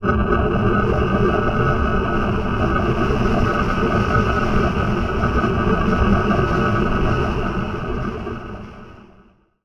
Trolley, Noisy; Zero-G Seismic Frequencies

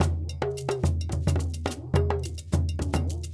Djoun, World Percussion; Donno, Bell, Shaker

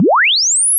fx riser 150 charge; Virtual Riot

